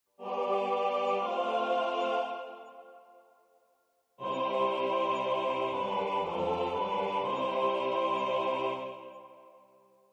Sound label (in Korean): 주3화음 전체